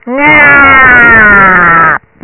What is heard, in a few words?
gyaa